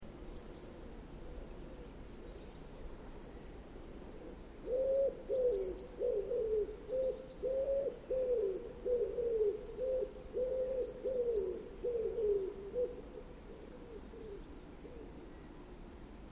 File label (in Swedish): Ringduva